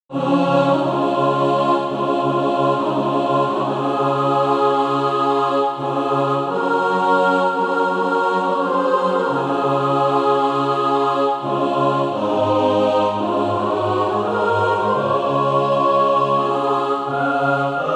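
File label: mixed, Practise Music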